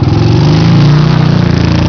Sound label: bike rev; TRANSPOR